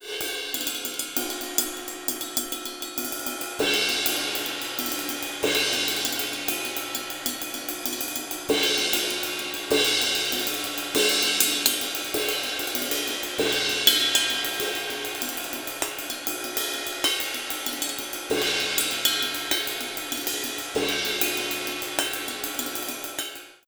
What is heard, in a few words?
Short Cymbals; Audio Envy; Studio Elite